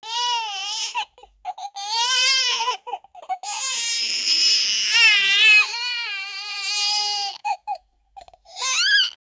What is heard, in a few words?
baby crying; infant